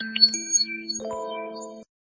Vivo Sms Ringtone; Vivo Y20s

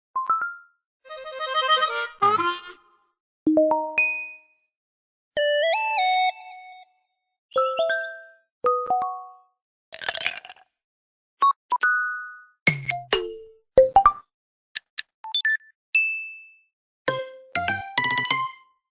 LG stock notification tones

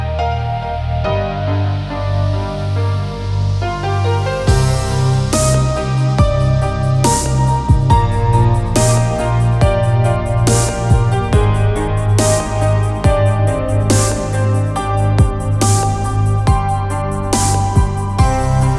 Xiaomi Ringtone; 12 Pro; Dimensity

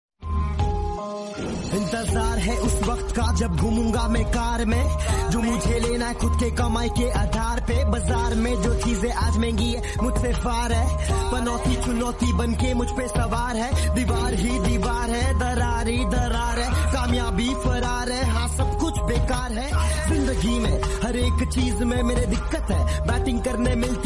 Hindi Rap

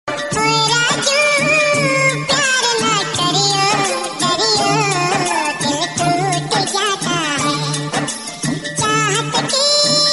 Funny Hindi Ringtone

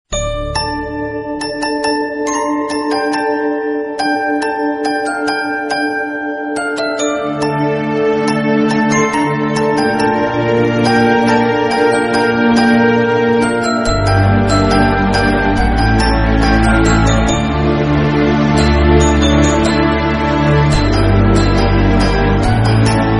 Hindi bgms

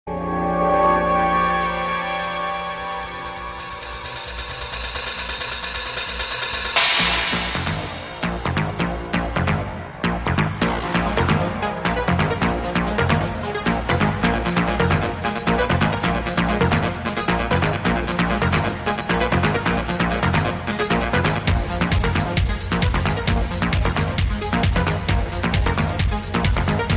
Hindi Disco Ringtone

